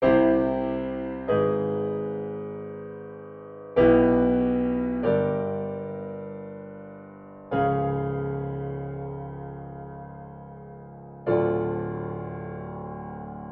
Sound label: post tonal harmony